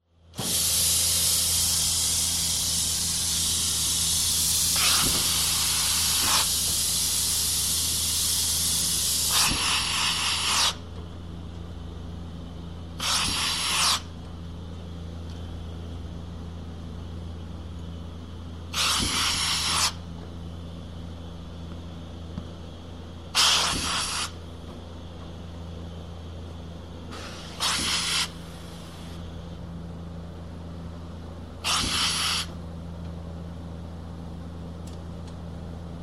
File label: Dry cleaning plant; SOUND EFFECTS1